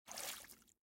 step in water

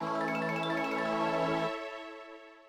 Unknown Jingle; Shining the Holy Ark 1996 Saturn gamerip

